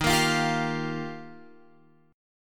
Eb Major Add 9th, Guitar Open F; Ebadd9 strummed

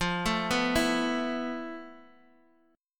F Major 7th Flat 5th, F, down, Guitar, Open G

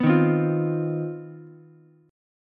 C Minor Major 11th C down Ukulele C6; CmM11 strummed; JGuitar

